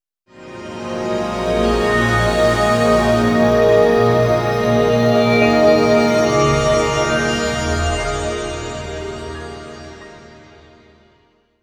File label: Hi Complex, Complex Wave